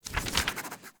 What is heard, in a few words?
UI Note Open